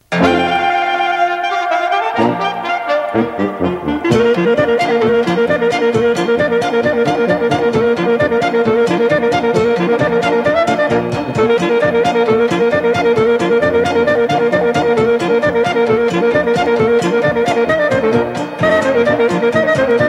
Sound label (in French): Maruntica de la Cajvana, Roumanie